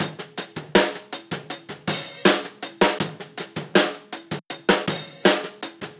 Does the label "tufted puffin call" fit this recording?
No